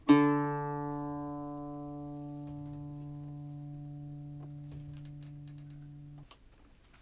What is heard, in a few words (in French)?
Gaucher re